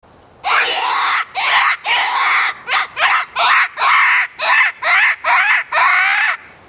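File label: peccary sounds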